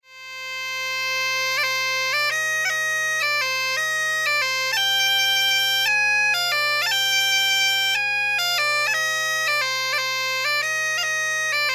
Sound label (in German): gaita melodie